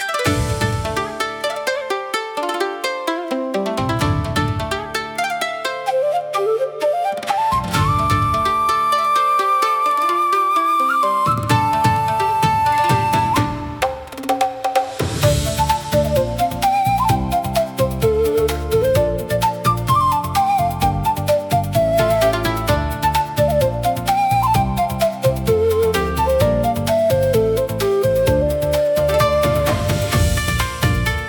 Festival Shakuhachi, light and japanese pop for festivals; bright everyday use